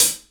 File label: light hihat a sharp key